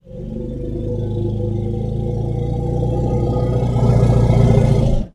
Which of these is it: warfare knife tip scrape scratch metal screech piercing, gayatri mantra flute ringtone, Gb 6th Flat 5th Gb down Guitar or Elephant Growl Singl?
Elephant Growl Singl